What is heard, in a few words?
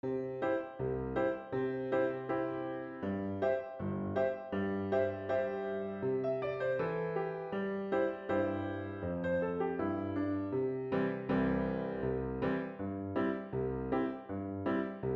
Piano